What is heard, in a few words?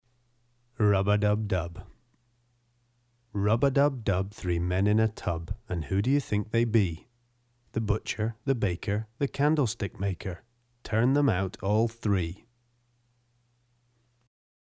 Rub a Dub Dub